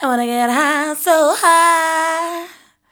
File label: VOC, Disco Fever VOCALS; Best Service ProSamples vol.48 - Disco Fever; AKAI